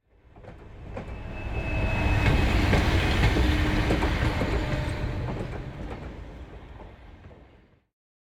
Train Short Low Speed Passing European Train Type - L to R; Hollywood Edge; sound designer's toolkit v2